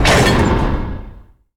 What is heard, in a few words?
Metal Grate Stop; Doors